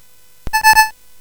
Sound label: Space Gun